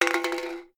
Impact Can

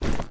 Backpack movement

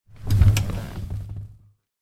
Bed Down

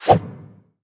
SFX - Stamp; uno

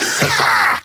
Vocal Adlib Male Laugh Long; bass house; fx vocals

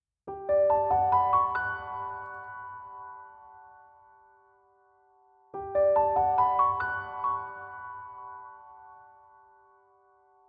Piano; A Traveler's Respite; Piano Tribute to the Octopath Series; My Quiet Forest Home

